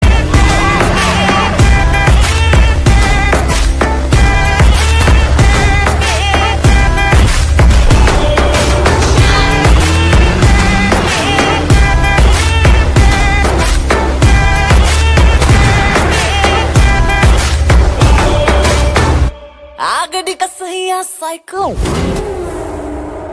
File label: Psycho Music Ringtone; Saaho 2019 Telugu Movie